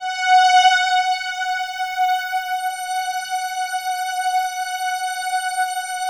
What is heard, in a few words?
COSMIC, PADS; Masterbits; Vintage Voltage